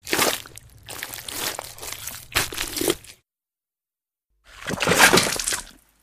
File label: Gore Splatters